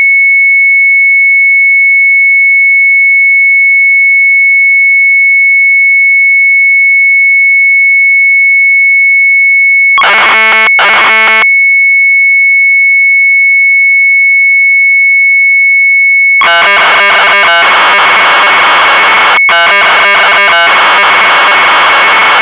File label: MZ800 Tape, sharp; RUBRIEKEN; retromelsarchive